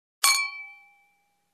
Wine Glass clink

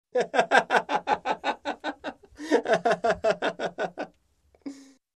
Adult Male Laughing

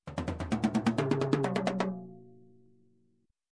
Slow Tom Groove

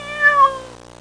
Single cat meow

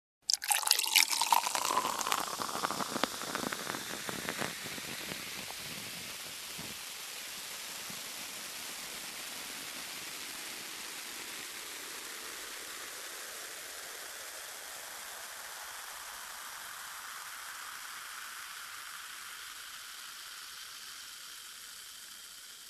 Soda Pouring sound

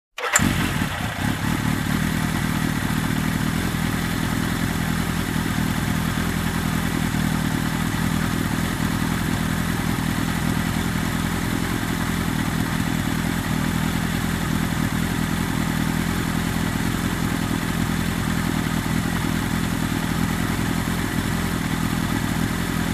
Bike engine running idle

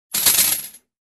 Metal coins rattle in box

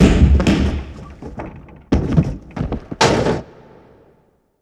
Fight Sequence with fallen props

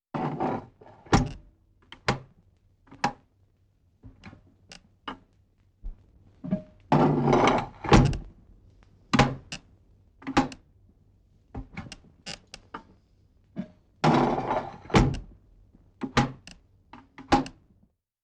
Firearm being loaded

